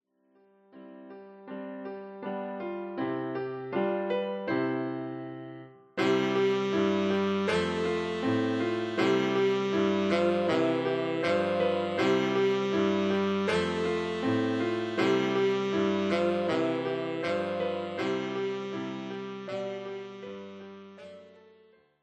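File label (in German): O du froehliche, Tenor Saxophone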